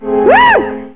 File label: scream